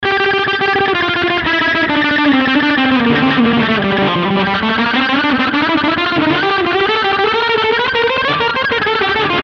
130 Lead Alt Rocker G

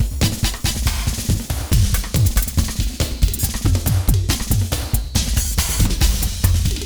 Wild Ride 140

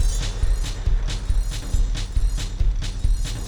Quieter Jazzy Moods 138; Loops

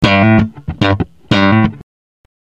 Bass Slap A 093

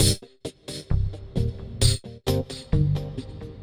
Funky 125-132 Cm7; Loops

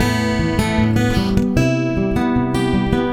Picking G

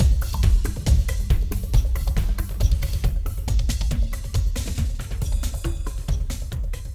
Clubbin' 138

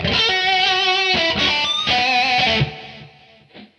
lead 122

